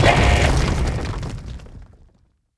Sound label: magic building fall; propack; archmage tower